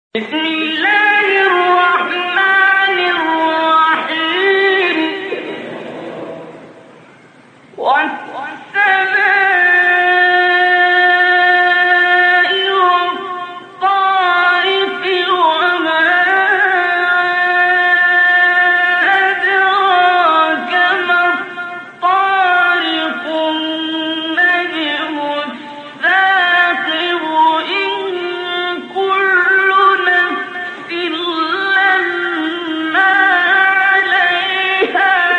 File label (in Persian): فراز ابتدای سوره مبارکه; استاد عبدالباسط